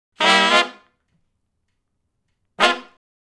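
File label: R&B Riff (Db) harmony; Sections; Crimson Blue and Fabulous Horncraft 4 RnB; Horncraft for R&B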